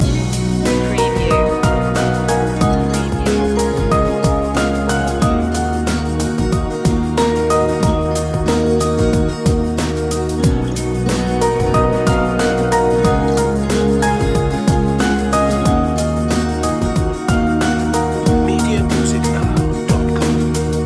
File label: seaside walk loop